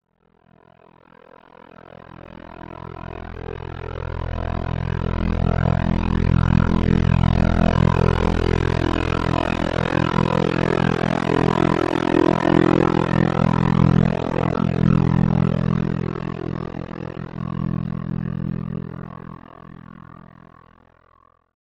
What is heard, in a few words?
Bed Chopper Lfe